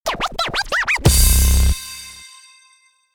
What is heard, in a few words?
Scratch Vibe; DJ sounds